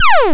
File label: Player Did Fire